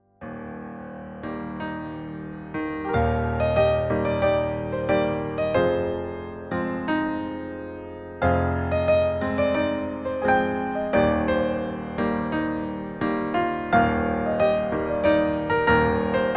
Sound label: piano ringtone